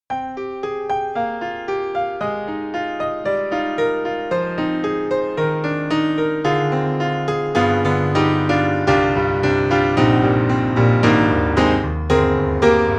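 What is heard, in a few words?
Yamaha C7 Close Natural Ambience, piano; Steinberg; The Grand 3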